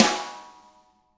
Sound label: RIM; ILIO; Double Platinum Drums 1